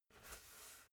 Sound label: basketball spin turn in hands short